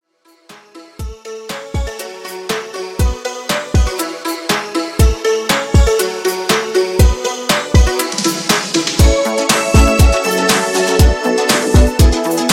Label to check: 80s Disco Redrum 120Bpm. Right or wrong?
Right